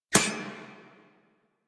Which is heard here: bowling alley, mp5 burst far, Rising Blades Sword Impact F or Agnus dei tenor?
Rising Blades Sword Impact F